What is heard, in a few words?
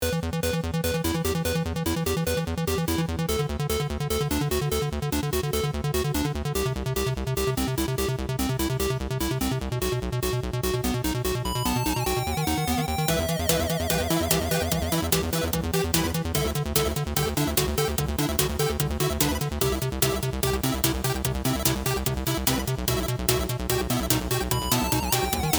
8 bit Music